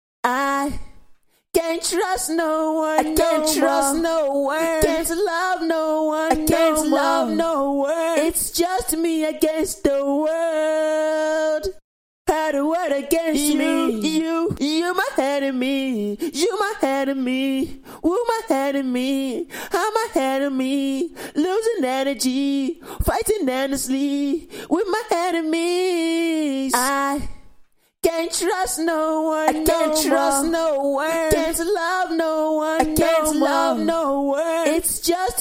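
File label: hip hop pop singing 72 EM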